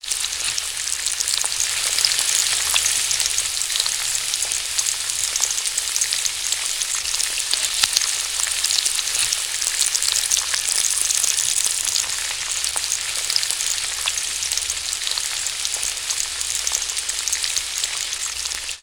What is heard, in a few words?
eggs frying